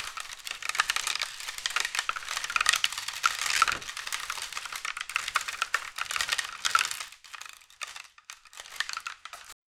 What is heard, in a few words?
foley, material